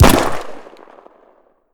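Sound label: light machine gun fire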